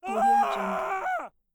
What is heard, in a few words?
male torture screams